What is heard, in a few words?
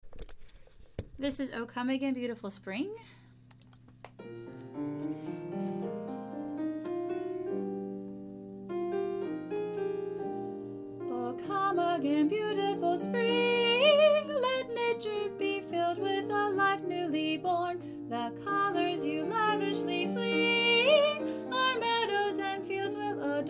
solo alto